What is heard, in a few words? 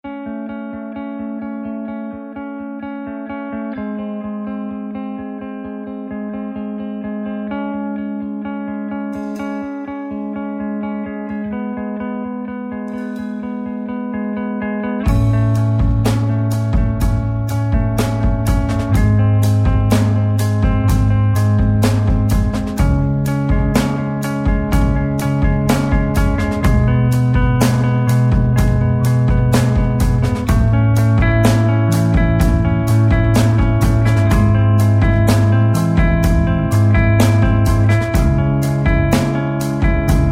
bells lament